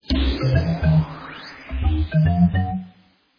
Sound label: retro video game flop stinger ident; philippe